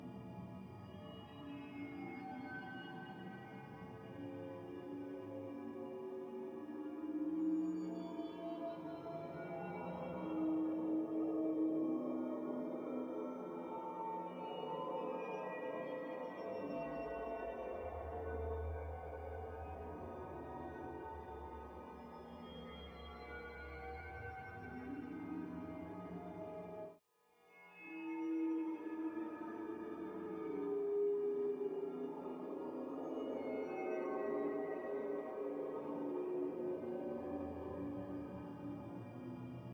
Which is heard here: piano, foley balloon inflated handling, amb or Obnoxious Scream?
amb